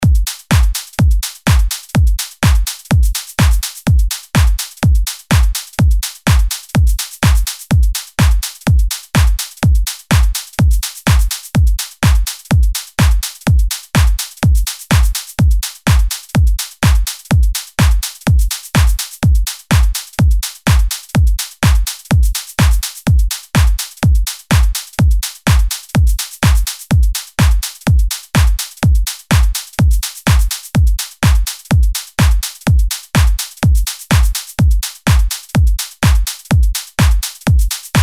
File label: DANCE EDM 125BPM